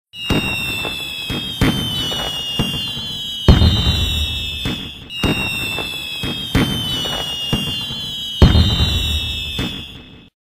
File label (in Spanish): fuegos artificiales